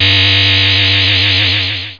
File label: Reso LFO